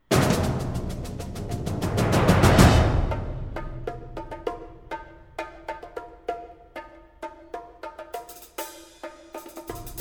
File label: Octet